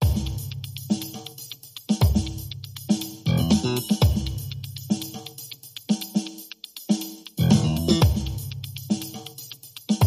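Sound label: Bass and Percussion Only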